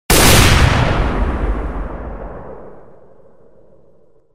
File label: gun; cave